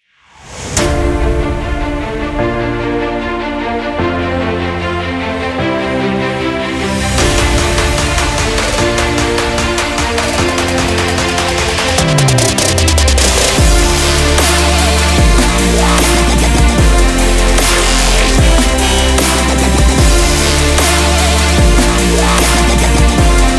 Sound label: Boobass; آهنگ های بدون کپی رایت بی کلام (موزیک های مخصوص ساخت کلیپ)